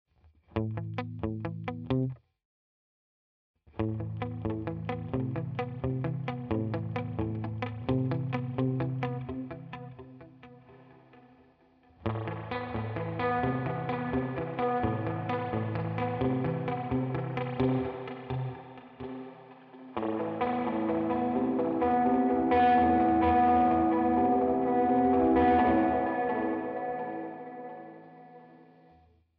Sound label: UAD DelVerb Precision Mod Spring